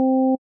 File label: Sine Saw Square Square, Synths; Multisamples; Ableton Live 12 Lite; Core Library